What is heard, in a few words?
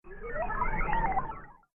Fast Droid Flyby